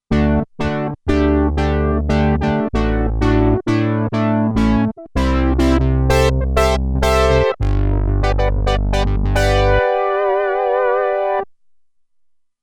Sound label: Brass, Roland Alpha Juno-2; Original Sound Chart patches